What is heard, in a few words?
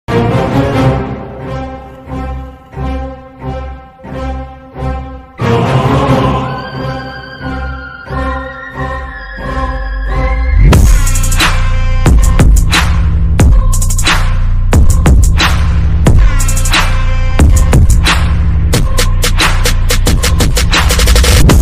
bgm ringtone instrumental ringtones; mankatha